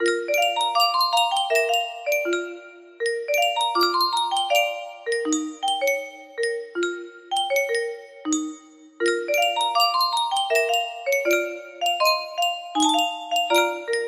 music box, music box melody

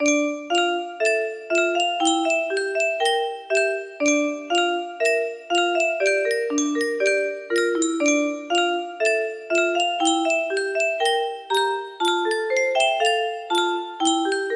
melody

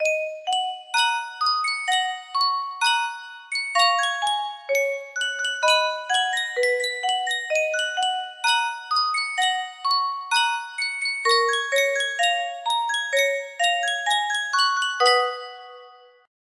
yunsheng music box

